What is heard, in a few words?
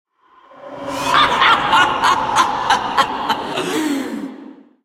laugh; 辛德拉（英雄联盟）; 暗黑元首